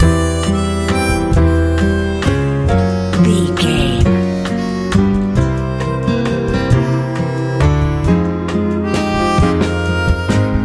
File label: country ballad